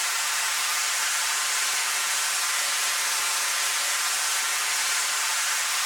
ambience pipe

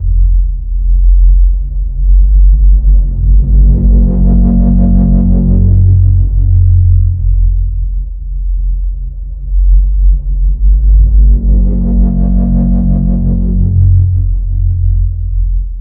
ambience energy big

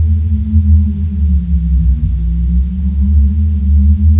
dry wind

